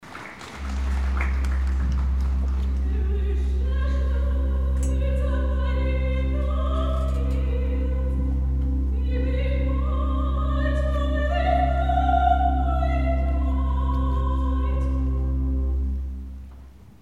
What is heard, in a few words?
Recitative for Soprano; Concert Recordings